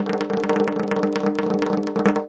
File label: arabic perc, Arabic Percussion Kit